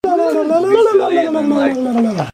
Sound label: tongue; chatsounds